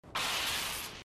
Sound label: Energy Sword; Halo